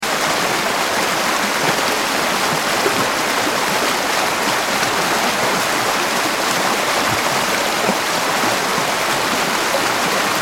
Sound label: River Flowing Fast; water; Rivers; Nature